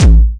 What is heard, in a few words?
Syn Dr, Overloaded Synthetic Drums; style kits